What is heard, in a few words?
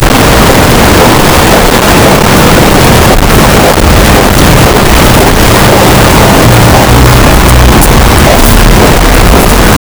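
footsteps on city sidewalk, pavement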